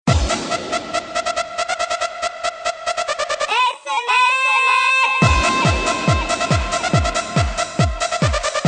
Sms Techno

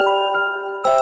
Twinkle

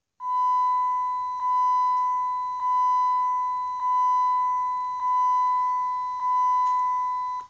Chime; Dodge Sbelt

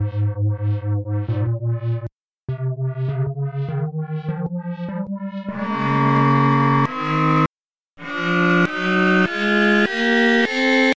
A Flat Major

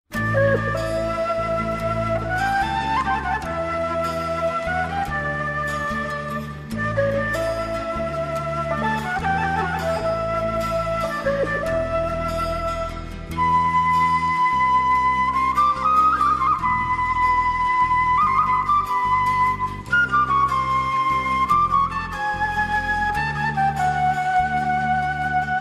Kaval

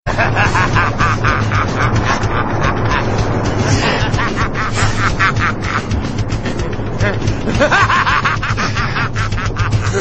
Laughs; Broly

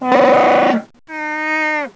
Wookie

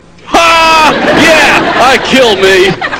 Laughing; Alf

